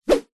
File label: foley whoosh whip swoosh fast; zapsplat